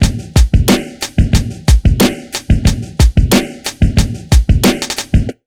BEAT L, Pure Electro; USB Soundscan vol.03; AKAI

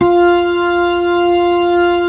B-3

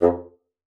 bassoon, F2; Xsample VOL-6

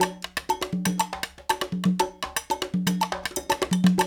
120 Songa, African Contemporary; Spectrasonics; Supreme Beats; Songa Ltn

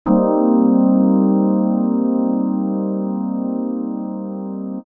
Digi Pads; Jungle; Jungle Frenzy 1

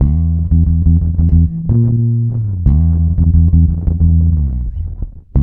E, bass; Spectrasonics; Bass Legends; 90 S.BRONX

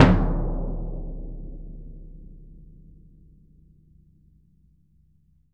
BS DRM FF; ILIO; Synclavier Percussion 2; World & Orchestral

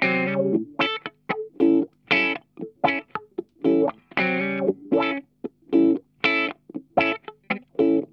CROSSING, Funk Guitar; Zero G - Funk Guitar

